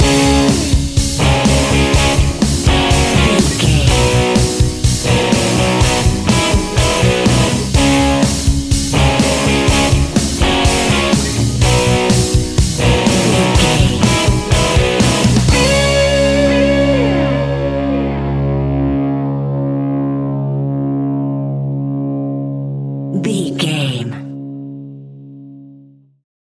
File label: rock; Catch 22 Music Network